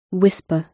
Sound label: whisper